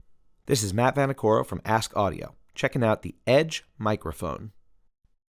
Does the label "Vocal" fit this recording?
Yes